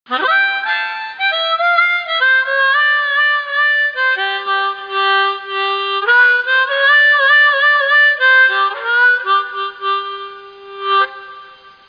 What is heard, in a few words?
Harmonica; freddi fish 4 the case of the hogfish rustlers of briny gulch